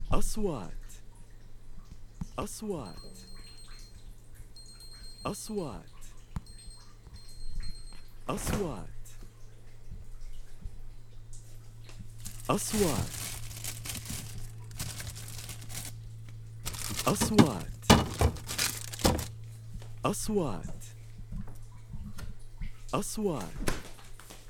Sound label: refrigerator kitchen